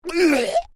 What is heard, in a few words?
MALE VOCALIZATION EEGH; Male vocal sounds; Exclamations; Voice